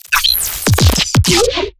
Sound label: glitch stab; Virtual Riot; Glitch Stabs